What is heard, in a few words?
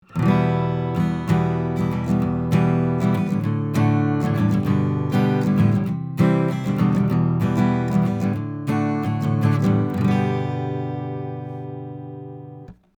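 Rode NTR Acoustic Guitar 50cm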